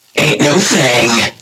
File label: Game Over